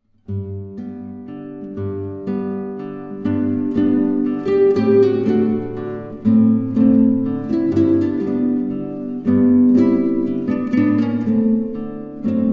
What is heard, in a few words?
ensemble